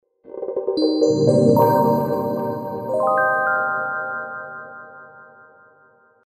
POWER ON OFF; NGM Vanity; MICROMAX

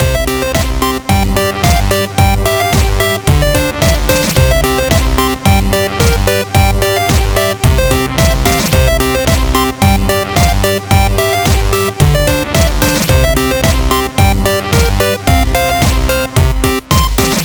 Green Pipe Major; Razer Phone 2; ringtones; Tregenza

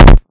Dock; i-mobile; i-style-711

